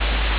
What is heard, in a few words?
ambience room tone